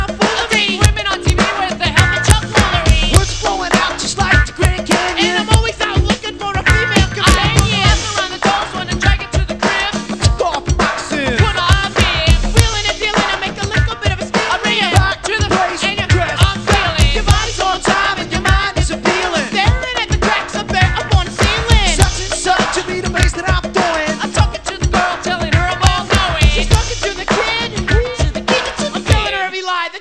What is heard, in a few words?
hiphop